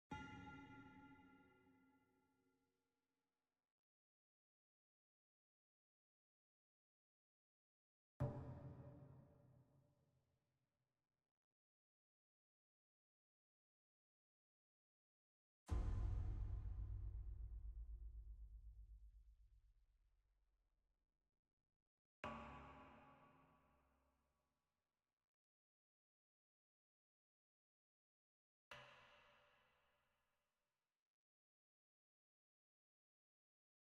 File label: Damage Hits